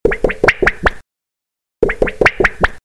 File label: Iphone 4s Blooop